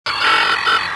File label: console, ambience; kejim